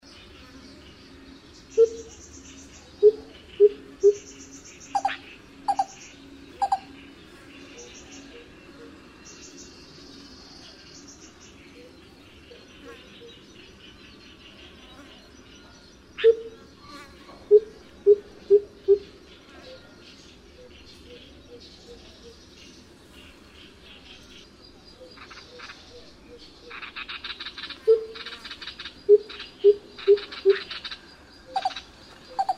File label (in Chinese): 番鵑; 鴉鵑; 杜鵑科/夏候鳥